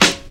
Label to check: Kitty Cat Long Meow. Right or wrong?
Wrong